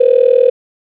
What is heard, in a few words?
Splash